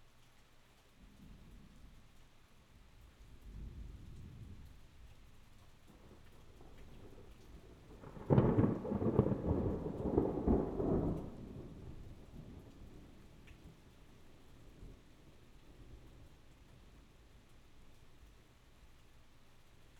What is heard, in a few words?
Thunder